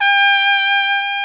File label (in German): SOLI TROMPETEN, Alpine Volksmusik